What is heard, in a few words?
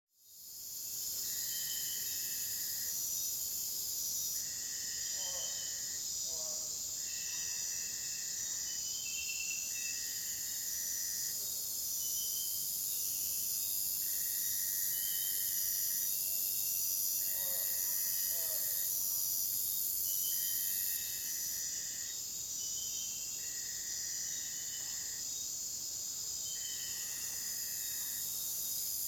Night Forest Ambient; children of morta; Home and Den